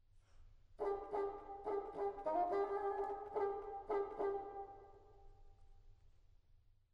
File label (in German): zaunkoemig motiv fagott